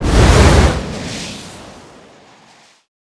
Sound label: ship spin